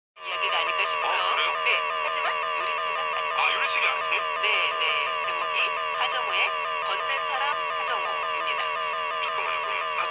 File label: AM Jamming 방해전파